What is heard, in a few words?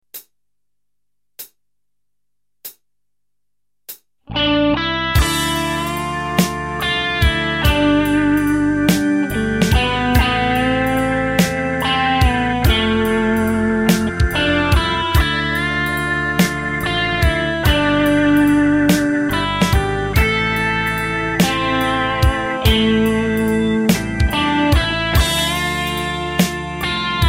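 String Bending, guitar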